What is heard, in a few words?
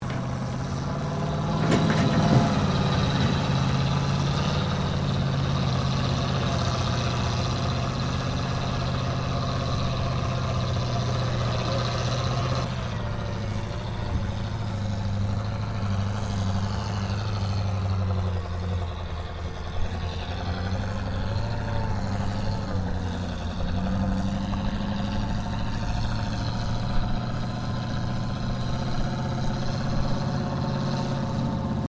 TANK BREN GUN CARRIER MANOUVER, British Universal Carrier; Vehicles